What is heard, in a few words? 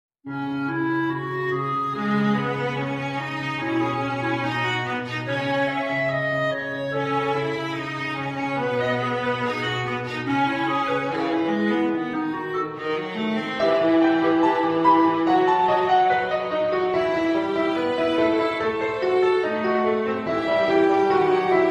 clarinet Viola Vignette